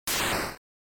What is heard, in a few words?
NES General Noise Phrase